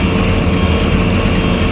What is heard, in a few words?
stacks, ambience; kejim